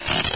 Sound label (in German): knarz; deliantra